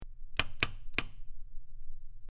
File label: Rotating Switch Clic